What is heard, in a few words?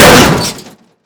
Vehicle Engines; Red Orchestra Ostfront 41-45